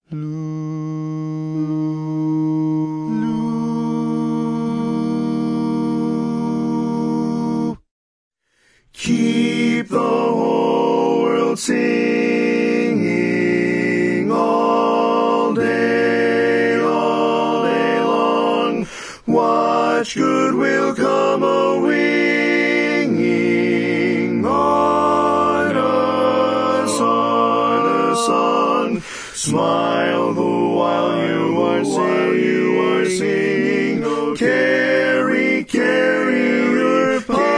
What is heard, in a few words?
lead, barbershop harmony